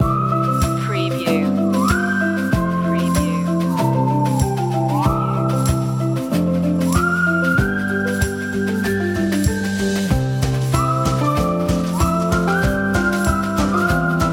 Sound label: conifers loop